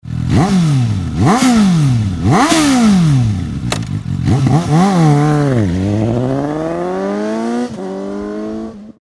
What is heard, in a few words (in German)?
Suzuki GSX-S 750 mit Soundeinsatz; REMUS